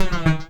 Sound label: Criket Sft, Cricket